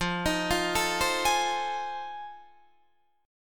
F 7th Sharp 9th, Guitar, Open D

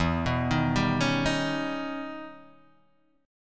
E Minor Major 13th E Guitar Open D

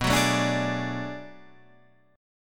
B Minor 13th B Guitar Half Step Down, chord

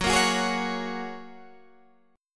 Gb Major Add 9th, down, Guitar, Standard; strummed; JGuitar

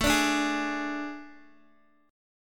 A Major 7th Suspended 4th A, Guitar, Open D

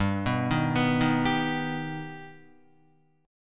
G Augmented, Guitar, chord; G+ arpeggiated; 2,2,3,4,3,3; JGuitar

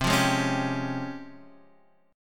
Db Major Sharp 11th C

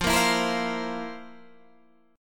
F Major 7th Suspended 4th Guitar Half Step Down

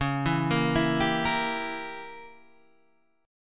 C sharp Diminished 7th, C sharp, Guitar, Open G; down; chord shape

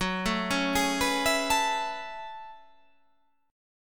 F sharp Minor 11th, guitar; chordshape; down

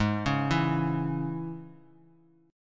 G sharp Suspended 4th Sharp 5th chord, down

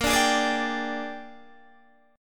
A sharp 13th, Guitar DADGAD

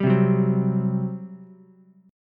G Major 7th Suspended 4th, G, down, Ukulele; GM7sus4 strummed; JGuitar

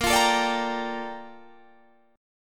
A sharp Major 13th, Guitar Standard; A#M13; strummed; JGuitar

